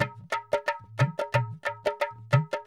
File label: Gabra Dholki Loops